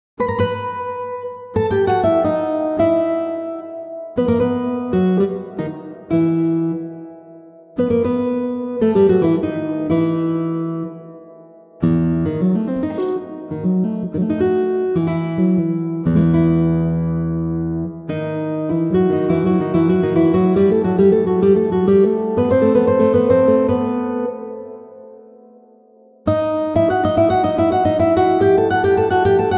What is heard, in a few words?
Bach Toccata in d-minor, Pianoteq and Organteq